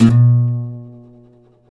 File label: C, Martin, guitars; Spectrasonics; Hans Zimmer Guitars VOL-1; MARTIN HAMMR